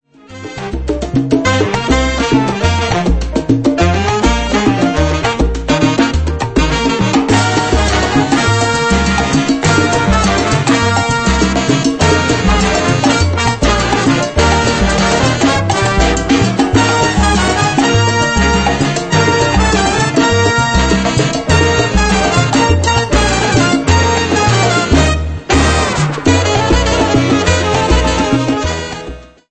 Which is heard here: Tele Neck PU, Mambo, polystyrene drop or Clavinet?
Mambo